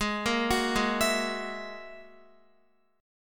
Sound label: Ab 7th Suspended 2nd Sharp 5th, Guitar, 4E,4A,5D,5G,5C,6F; Ab7sus2#5 arpeggiated; x,11,8,11,8,11